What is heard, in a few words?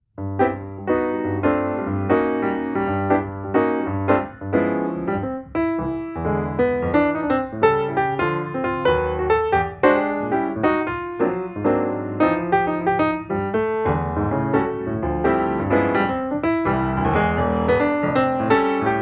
Jazz Theme; macktvpod